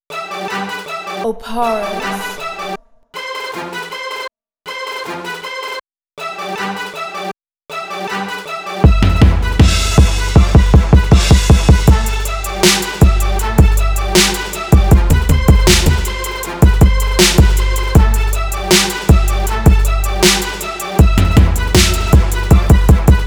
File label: 79 BPM, instrumental